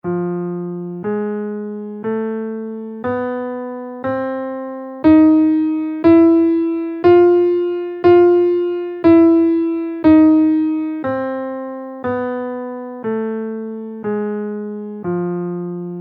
lydian 2 6 scale in F, guitar

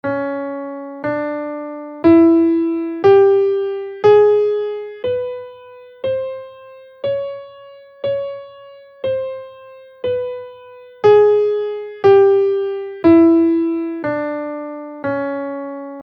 enigmatic minor scale in Cm

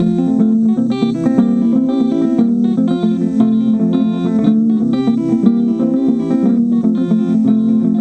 bomba loop

